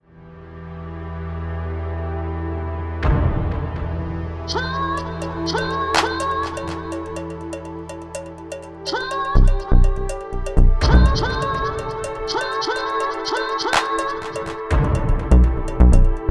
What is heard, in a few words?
Ambient Mix